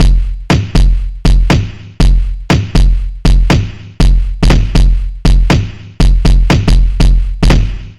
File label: Fat Break 120bpm